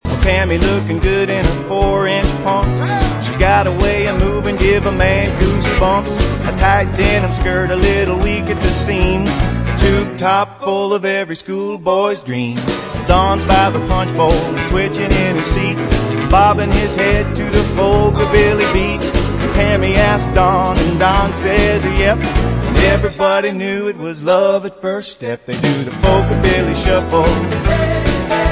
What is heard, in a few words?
folkabilly